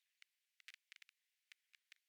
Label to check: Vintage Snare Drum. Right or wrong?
Wrong